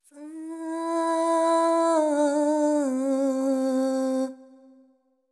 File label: ETHNO FEMALE, Vocals; ethno2